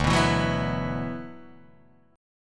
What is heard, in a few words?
D 6th Add 9th D, Guitar, Open D